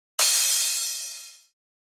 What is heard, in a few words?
Cymbal Crash